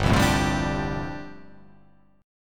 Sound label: G 9th Suspended 4th